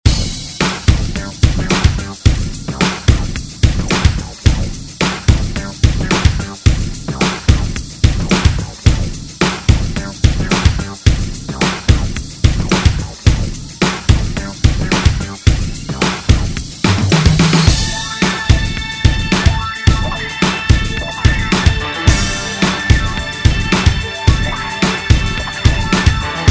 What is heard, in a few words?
70s Ext Redrum